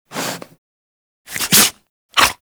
Kubrow Tame Sneeze C; Warframe

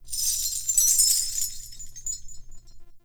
Binaural Chains; Ambience; Warframe; Shadowgrapher

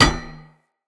Unarmed Punch Impact Metal Shield

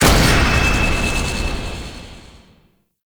Drone Destroy; Warframe; Veilbreaker; Venkra

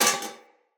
Foley Armour Step E; Enemy; Mockeries

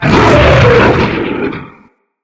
Random Gutteral, Infested, Quadruped; Characters

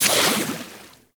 Water Sprayer Beam Stop; Warframe; Weapons

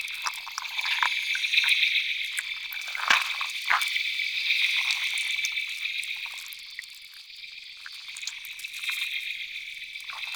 Landscape Insect Loop, Stereo

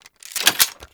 SMG Reload; Warframe; Tenno; Sundial SMG; Weapons

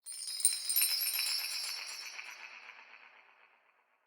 Clan Dojo Small Bell Jingle; Warframe; Dojo Randoms; Ambience

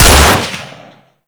Magnum Shot; Warframe; Tenno

